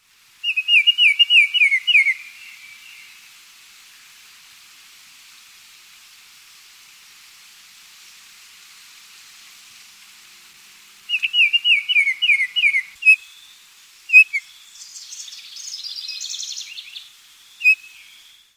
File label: baltimore oriole